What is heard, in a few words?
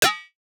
snare, Tin